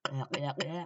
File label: gulp gulp gulp